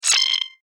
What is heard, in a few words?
High Pitched Electricity Bleep; electronic sound effect